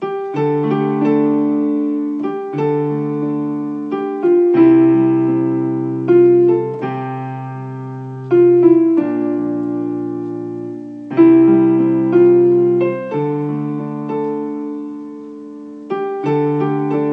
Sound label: Instrumenta; Humsafar